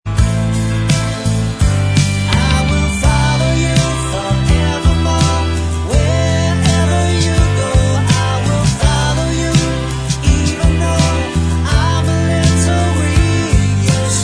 Bvox

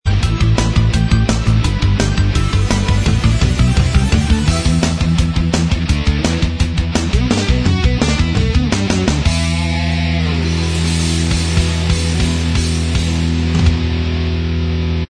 Inst Track; Team America

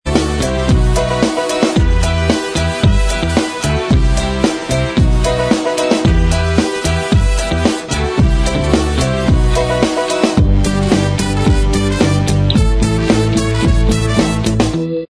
Inst

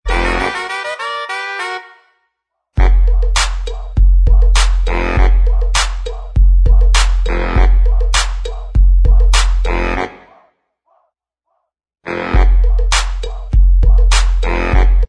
Inst

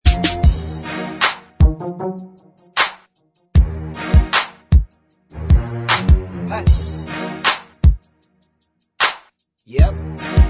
Bvox Track

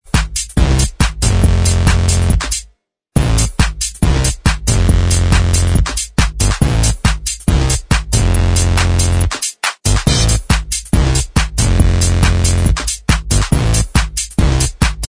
Inst

